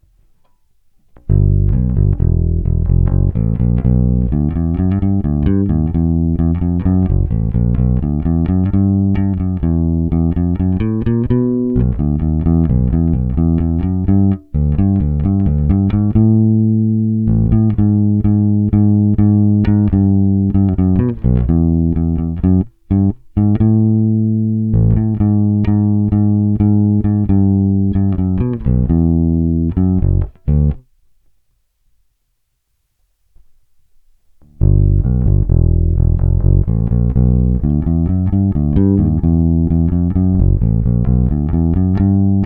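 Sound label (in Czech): Thomastik Fender TF snímač, Struny Thomastik-Infeld jazz flats; Téma: Jay Turser Precision bass JTB-400C-BK-A-U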